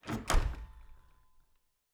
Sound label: Cabin, front door, split door, oak, large, iron fittings, close, wide; Eiravaein Works; Vaeyan IV